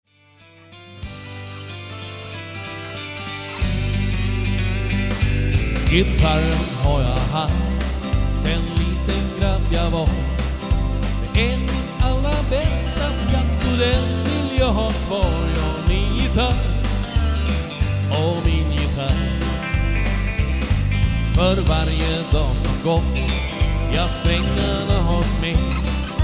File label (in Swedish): Min gitarr